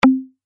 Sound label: Precipitate Woodblock; phet io wrapper sonification; molarity